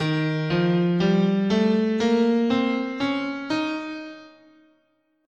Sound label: D sharp Lydian Dominant, scale